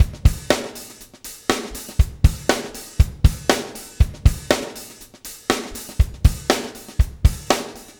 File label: DUGA, Backbeat